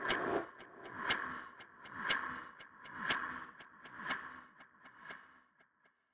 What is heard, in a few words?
Anxiety Loop